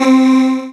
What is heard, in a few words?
Synths C; Vengeance Samples